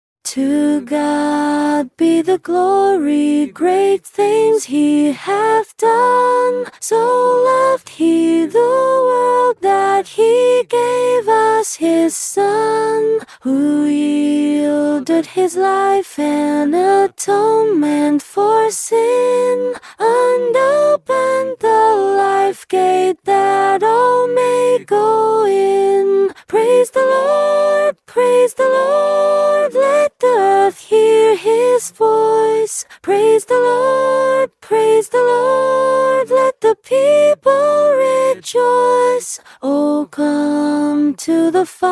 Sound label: To God Be The Glory Soprano Vocal Guide